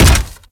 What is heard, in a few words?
Step; Warframe; Corpus; Arachnoid Wraith; Steps